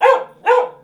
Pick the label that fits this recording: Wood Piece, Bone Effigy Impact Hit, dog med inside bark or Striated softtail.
dog med inside bark